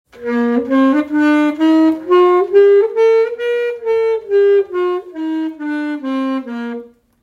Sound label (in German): So klingt das Saxophon